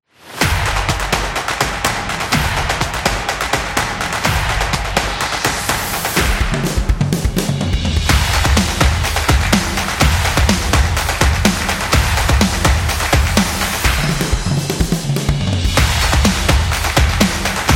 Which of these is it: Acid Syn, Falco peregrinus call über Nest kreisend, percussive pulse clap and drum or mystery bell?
percussive pulse clap and drum